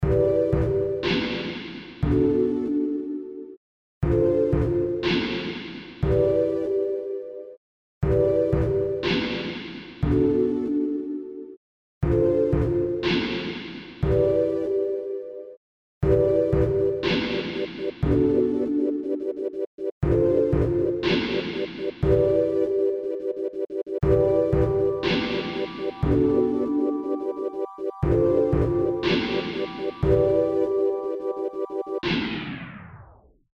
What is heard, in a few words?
Sinewave